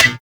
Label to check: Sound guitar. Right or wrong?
Wrong